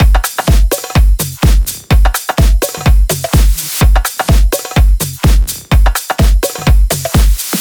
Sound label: Kit 12, 126BPM; Purple Kit; Vengeance Samples